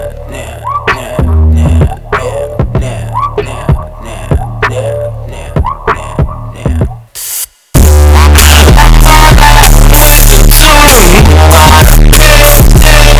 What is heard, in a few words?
BASSBOOSTED